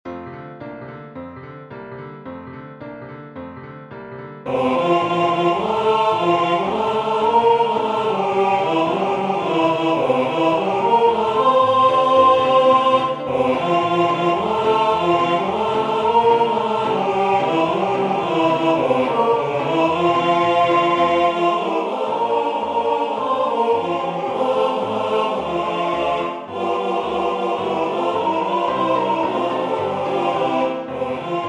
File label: piano all parts